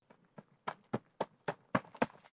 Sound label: steps on gravel